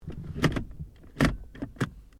Car Ford Focus Gear Change When Off; Ford Focus hire car; Cars; Vehicles

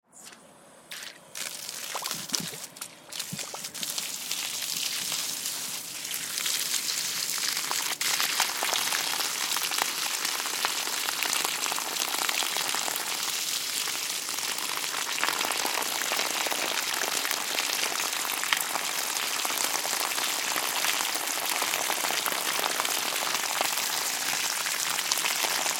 Water Garden Hose Flowing Grass On Run Off; Yard Tools; BigRoomSound

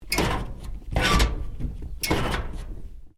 Locker Metal Old Door Latch, Old metal lockers; Metal Doors

